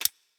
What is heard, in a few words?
click; spellbound